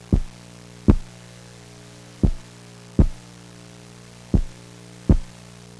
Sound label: SLOW HEA